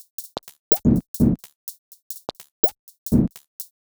Top 125bpm, House Drumloops; 90 s Sample CDs; Zero-G House Fabrik Drumloops; ZG Drumloops

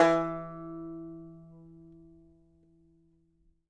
banjo E3, banjo notes; Sampleheads - Whole Lotta Country 1 & 2

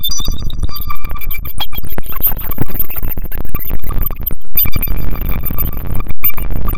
loop, techno atmos

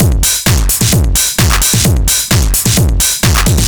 Beat Valve 130, distorted drum loops 130bpm; musicradar

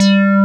UNDER X BASS, Synthsonic; Pro-Rec - Synthsonic; BASSES

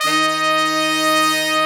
BRS, R&R Horns

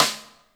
SIDESTICK; InVision Interactive; Lightware VOL-1

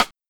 Linn, LinnLinn Drums

